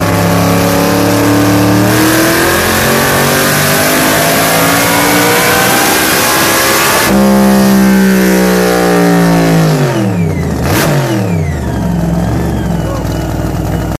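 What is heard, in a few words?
Supercharger Dyno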